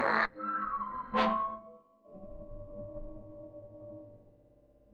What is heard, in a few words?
funny